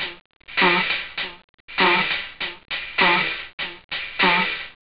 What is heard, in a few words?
vocal, house; OctaMED SoundStudio V1